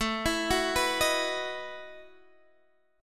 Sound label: Gb Minor 13th A